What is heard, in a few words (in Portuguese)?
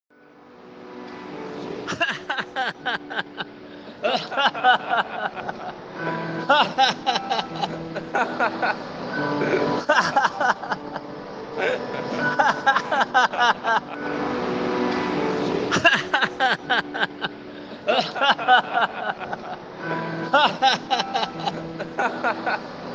Risada; Ultra Aliens